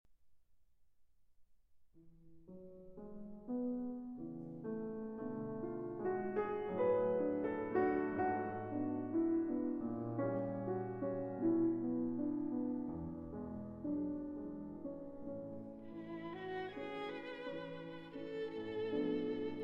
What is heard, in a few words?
Romance For Violin and Piano